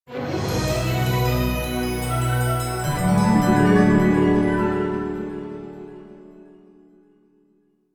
Music Studio Logo; Mr Nutz

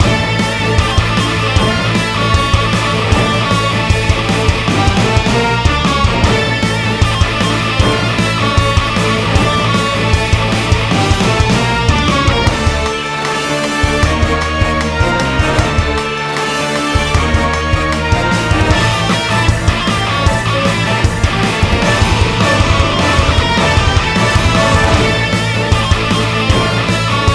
Raid loop

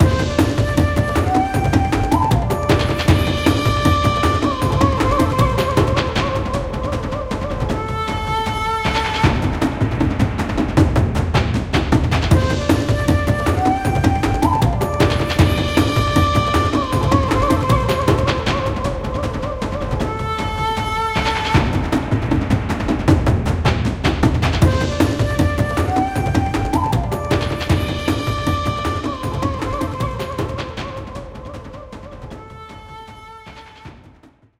Enso Sushi (Win); Cooking Dash; iOS mobile

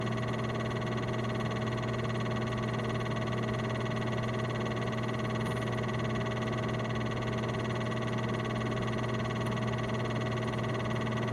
light machine

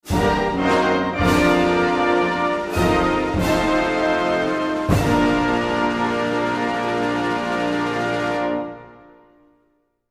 win jingle

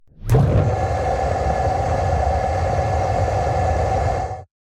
Mountain Sustained Lava Geysers; Mountain SFX

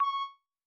Birb Note One

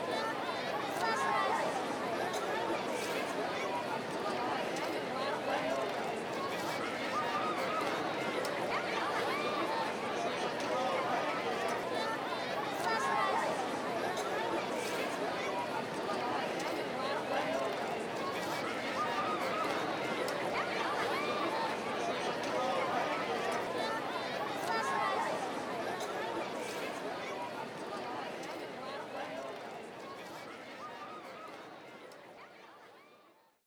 atmo neutral loop

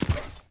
robot step; teardown ps5 windows xbox series xs gamerip 2022

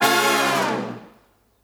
Flashover

